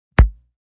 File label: Bass Lazer